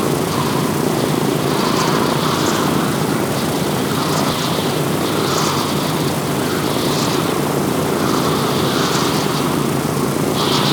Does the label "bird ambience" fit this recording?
No